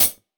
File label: sizzly closed high hat sample A key, Royality free closed hi hat sound tuned to the A no